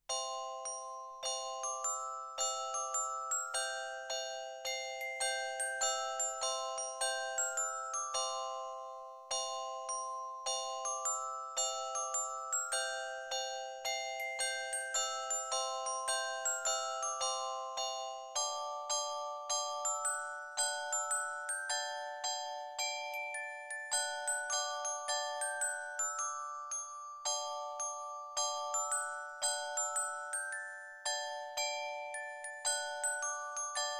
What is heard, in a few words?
glockenspiel melody